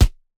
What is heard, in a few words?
Punching Percussion, Straight Glove, raw; 99Sounds